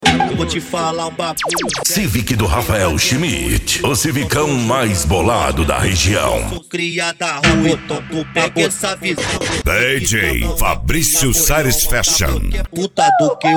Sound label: funk mega bass